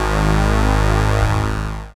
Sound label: SYN DANCE; Sound & Vision - Gigapack II